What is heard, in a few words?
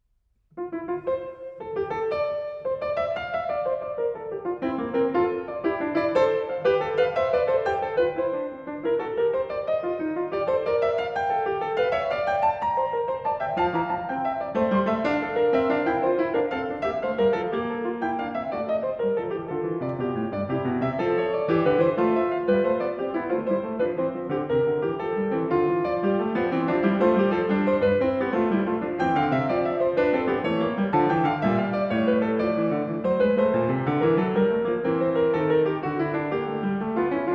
Fugue in F Major; Bach; The Well-Tempered Clavier; Fugue No. 11; BWV 880